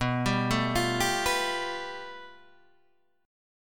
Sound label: A 7th Suspended 2nd Sharp 5th